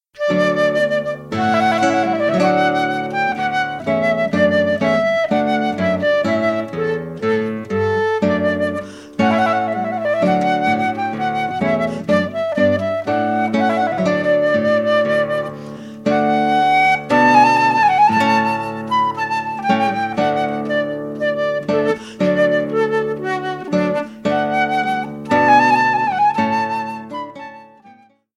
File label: Trumpet Voluntary, guitar